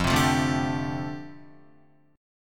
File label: F 6th Add 9th F down Guitar, chord; F6add9 strummed